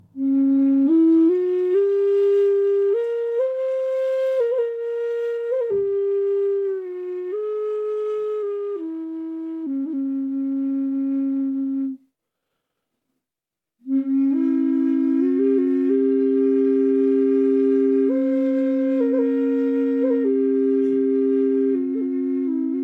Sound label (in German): Cis 440 Bass ohne, drone flute indianerfloete doppelfloete cis 440 hz; ahorn